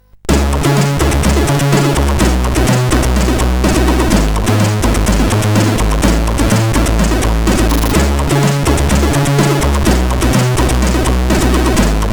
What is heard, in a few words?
sid CSG8580; Outrun II Remix